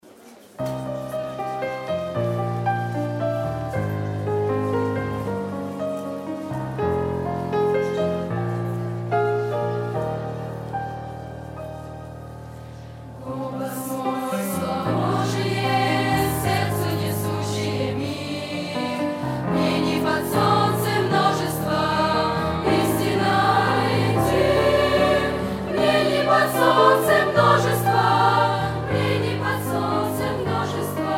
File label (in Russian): пение; Голос слова Божьего; 2016 Апшеронск, Подростковый лагерь